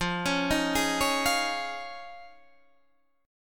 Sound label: D sharp 7th Suspended 2nd Suspended 4th F, Guitar